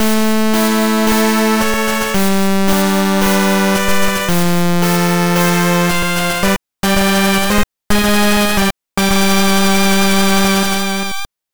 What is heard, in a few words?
All Clear; Mega Man IV; Rockman World 4; GB